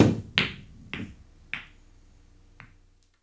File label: POOL HIT; AKAI S6000 CD-ROM - Volume 6; Human; SPORTS